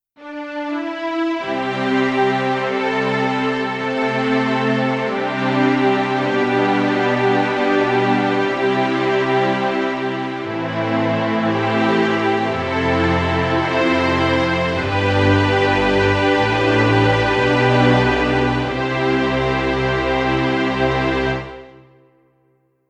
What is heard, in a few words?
CA67 Soft Orchestra